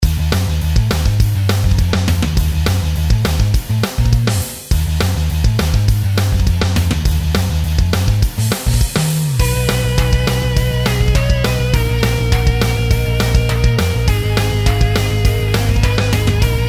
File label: Battle bgm; Witch's Weapon; Android